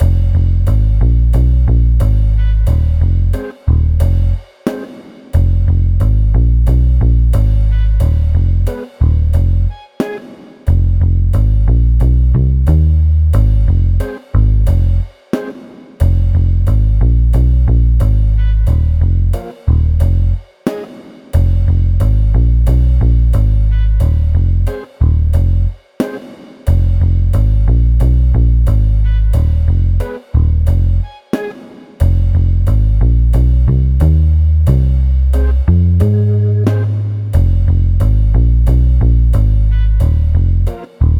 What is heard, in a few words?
vinyl noirsploitation funk